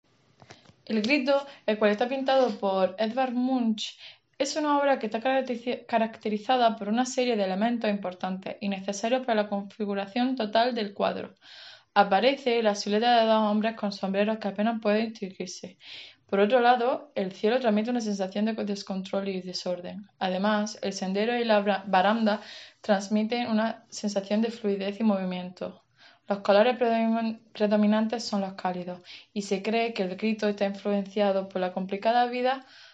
el grito

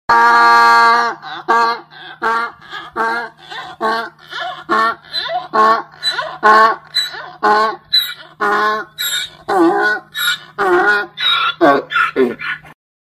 Burro Rebuznando

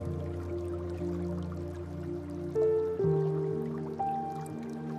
MÚSICA PARA CONTROLAR LA ANSIEDAD, MÚSICA PARA RELAJARSE MEDITAR O DORMIR

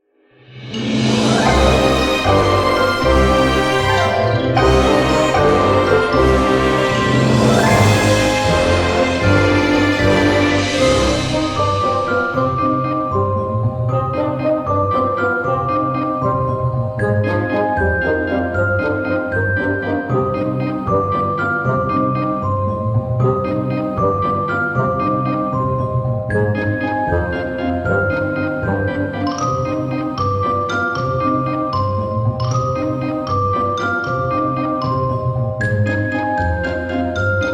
musica de fondo para halloween

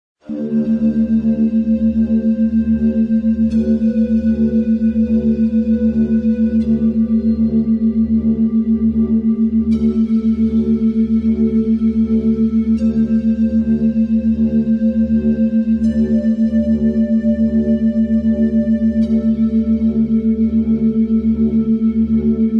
Ondas Theta para MEDITAR PROFUNDAMENTE y relajarse

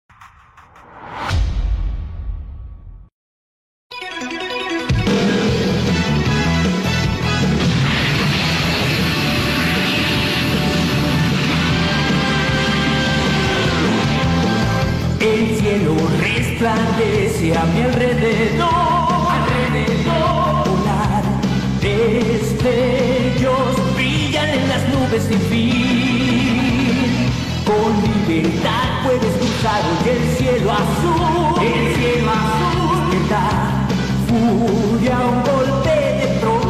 Opening en Español Latino; Dragon Ball Z Kakarot

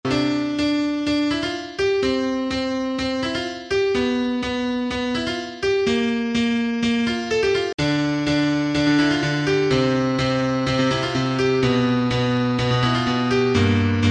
Melodía Música

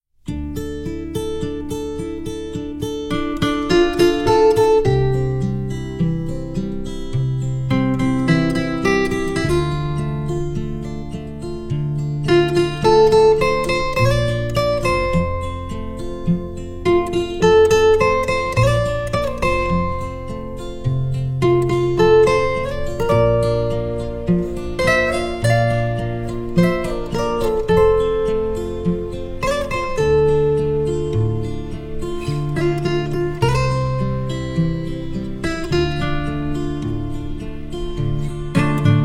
Guitarra; Tutorial

